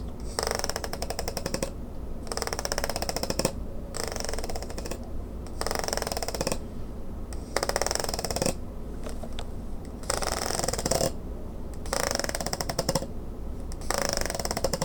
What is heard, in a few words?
Strumming Plastic Cups